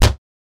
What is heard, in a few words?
Cabinet Door Slam Cl